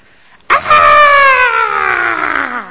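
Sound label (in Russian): вопль одиночный смешной близкий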